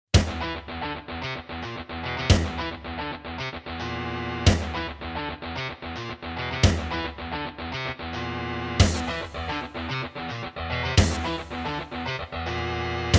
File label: Hammond GM-1000; Doom